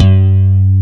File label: BASS, MIDI BASS; East West - Global Sounds